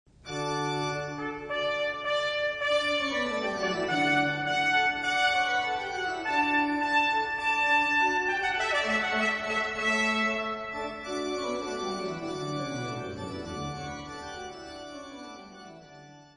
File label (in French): Trompette